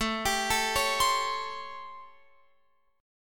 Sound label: A 7th Sharp 9th, Guitar, Open F; A7#9; arpeggiated